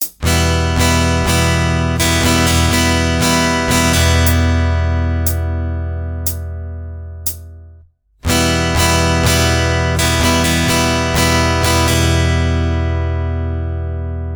Variations of the Modern Strumming Pattern SLOW, guitar; Ultimate guide to strumming book